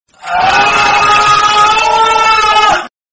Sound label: man screaming meme